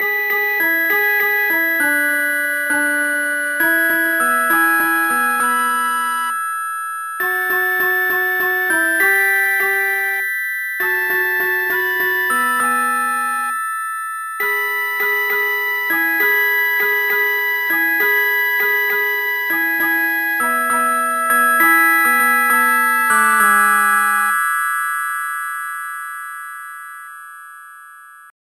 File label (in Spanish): flauta teclado metalofono